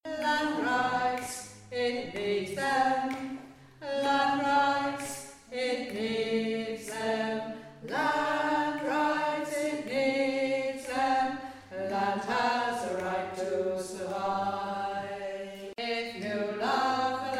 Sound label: Alto